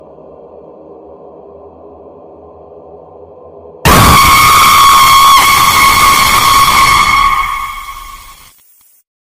Dangerous Horror Movie Sound Ringtone; ringtones

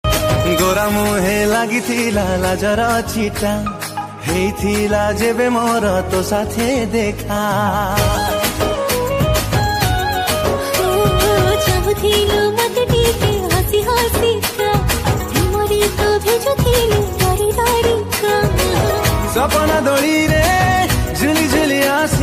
Odia Ringtone

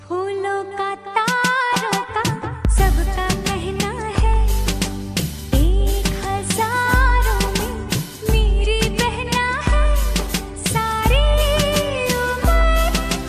Female Ringtone

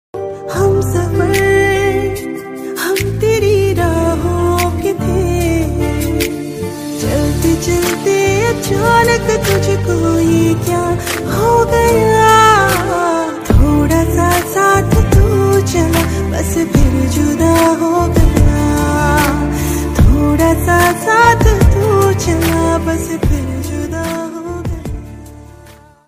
Sad Ringtone

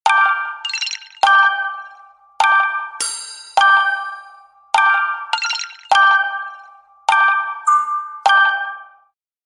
Nokia Asha Silk Ringtone; ringtones

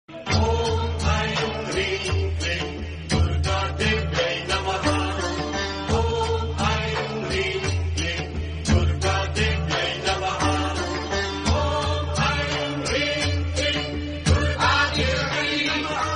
Om Namah Shivay Ki Tone; ringtones

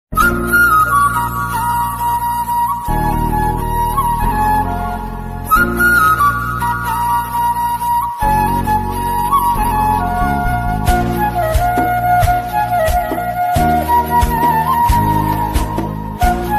Tamil Love Flute Ringtone; ringtones

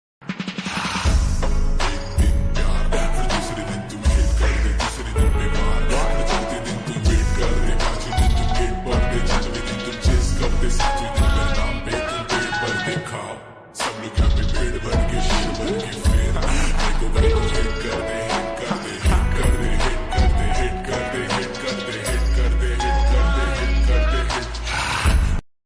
Slowed Ringtone

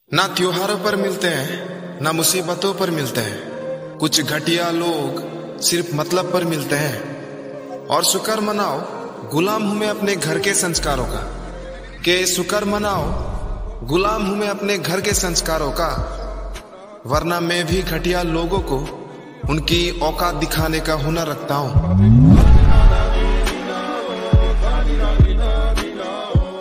Boys Attitude Ringtone; Ghatiya Logo ko Aukat Dikhani Hai

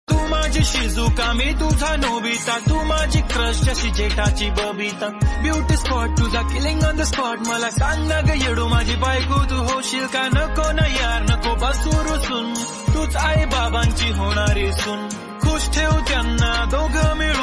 Marathi Ringtone

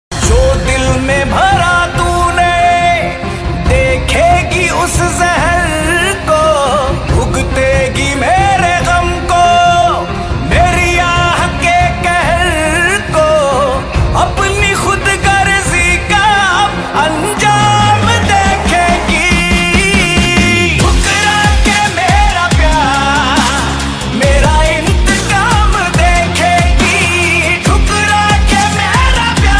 Sad Ringtone

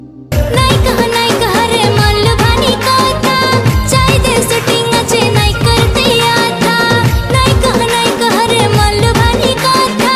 Odia Song Ringtone

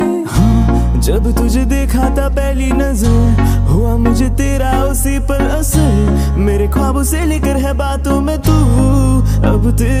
Hindi Version Ringtone

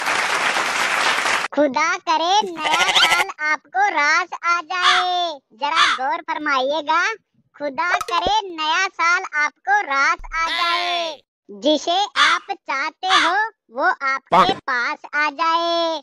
Happy New Year Ringtone

